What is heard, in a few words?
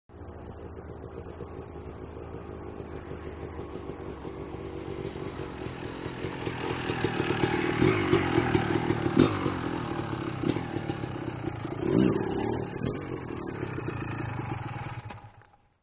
Motorcycle 250, motocross